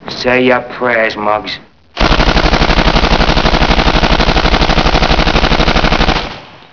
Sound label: tommy gun